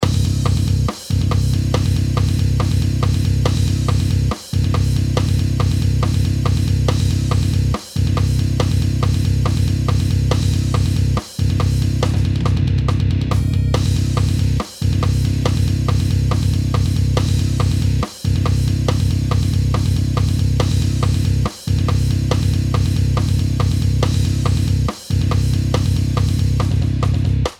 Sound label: Riff, Metal Riff